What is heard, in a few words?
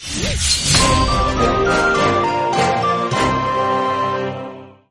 boat pve lose